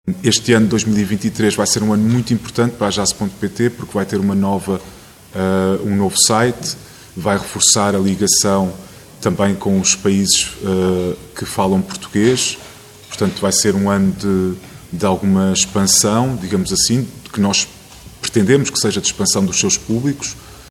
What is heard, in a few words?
JAZZ